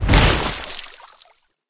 victory water exit; Smite; Sol

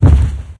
foot land; smite; zhongkui

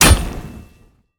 basic impact; smite; thanatos

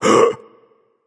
nv breath, vox; smite; voicepack; vulcan

